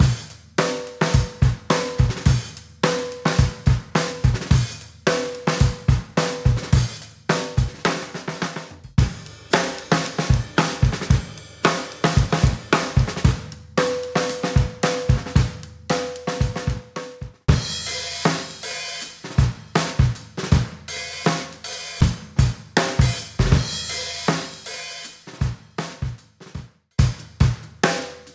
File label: classic breaks